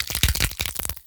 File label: Collisions Bodyfall; Lurker; Evolve; Gorgon